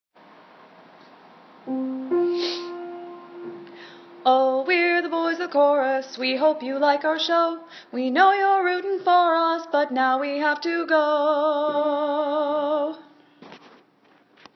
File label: Boys of Chorus